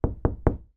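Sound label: kayak plastic hand knocks x3; transport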